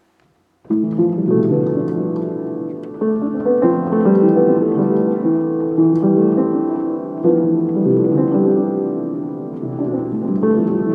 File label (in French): versets